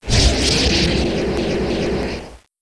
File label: takeoff